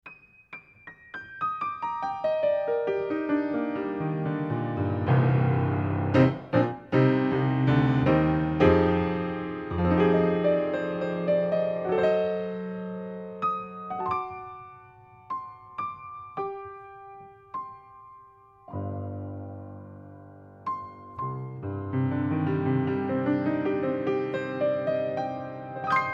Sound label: Piano, 30cm Omni Pair To Card